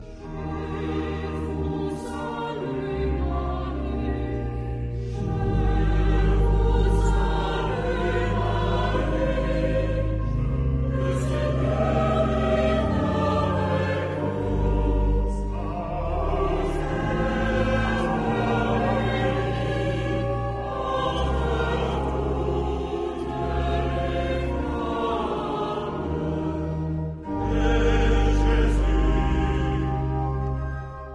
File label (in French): orgue; Launay